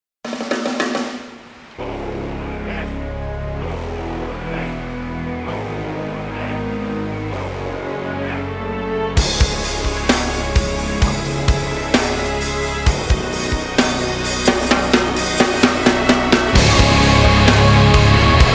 instrumental theme

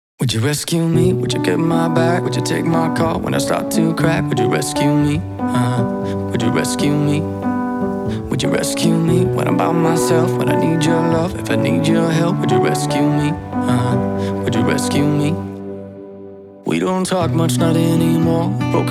acoustic

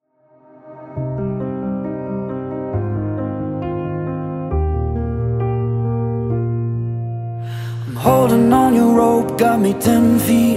hardstyle remix

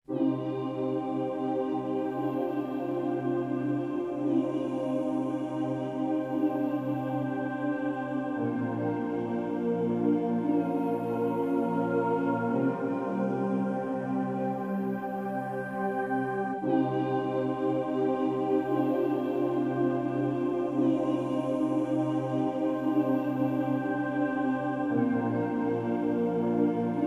instrumental

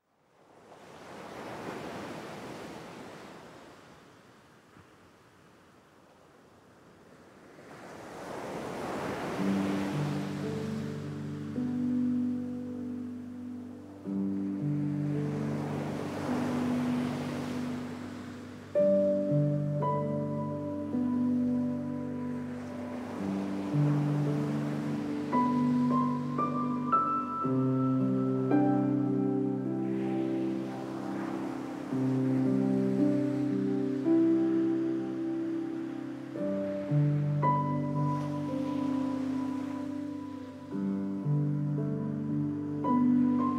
piano restful waves

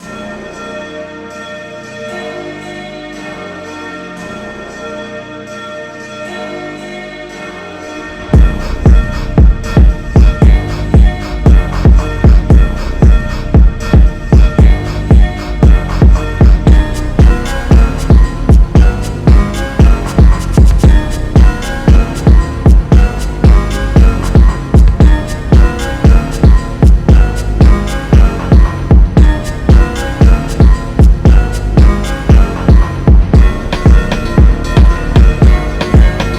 slowed reverb

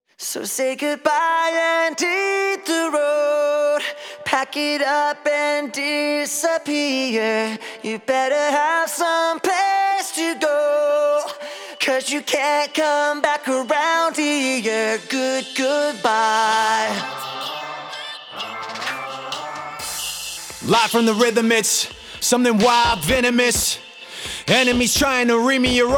acapella

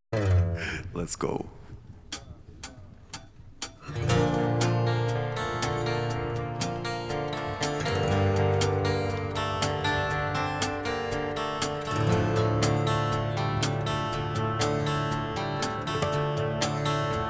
acoustic live

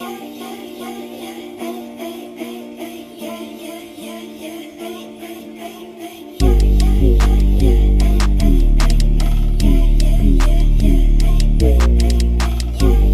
sped up remix

